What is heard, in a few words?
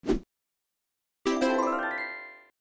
throw right answer